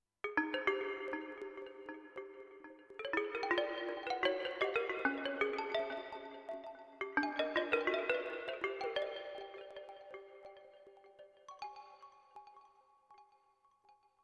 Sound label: African Wind